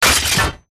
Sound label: gunstand shotgun reload; gow; turret judgement